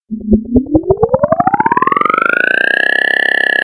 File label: UFO